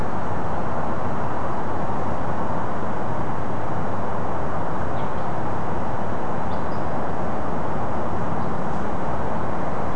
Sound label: unidentified, nocturnal flight call; nocturnal flight call project in beijing autumn 2021